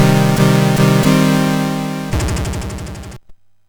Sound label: Invader Alert, sid MOS6581R4